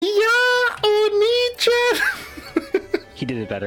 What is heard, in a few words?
kyaaaaaaaaaa